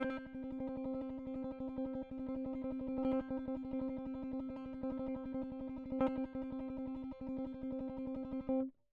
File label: electric